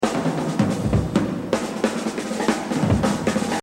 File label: Exercise, jazz drumming; Exercise 27 Buddy Rich - 100; The Evolution of Jazz Drumming (Gottlieb)